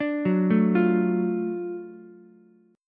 F sharp Minor Major 7th Sharp 5th F sharp down Ukulele; arpeggiated